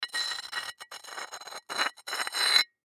Stone Scrape Rock; Foley; Rocks; Materials; Blastwave

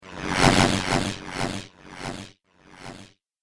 Flashback Transition Whoosh; Whoosh Sound Effects

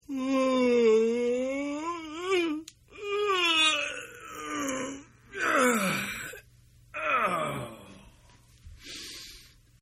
Yawn; Yawning; Voice

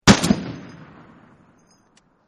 M80a3 Single, M80 Single Explosion; Bomb; Bombs; Explosions; VRSonic

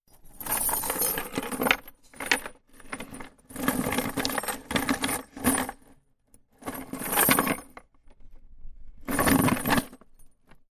Rattle Chain In Wooden Box Long; LYN104 package of Chain sounds; Metal Sound Effects; LynneMusic

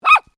Animal Dog Poodle Miniature Bark Close

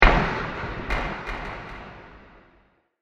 Bursting Wood Impact With Delays; Impacts; Bursts (Sound Effects:Impacts); CraftedSignals

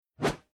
Whoosh Swish Sweep Plastic Pipe Spear Sword Swip; Title Graphics

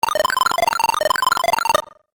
Game App High Score, Video Game Sound Effects

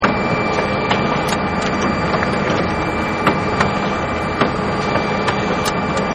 Copier loop, Copiers Open, Copier Close; Miscellaneous Office; Offices

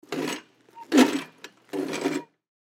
Dolly Roll, Dolly Roll Short; Dolly Roll Short Multiple; Rolling; Industry; Sound Effects:Objects; Blastwave

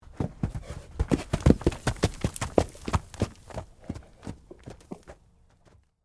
Horse Clydesdale, Pass, By, Trot; Animal; Horses (Sound Effects:Animals)

